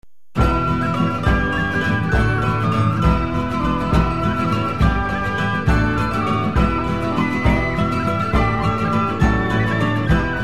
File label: Traditional Irish Drinking Song